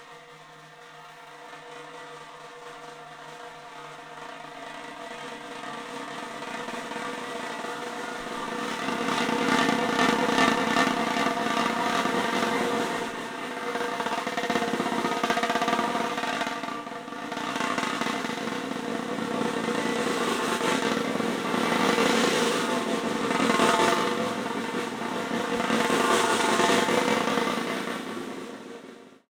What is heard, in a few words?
MARO MOTION; 3D Sound; 3D Drums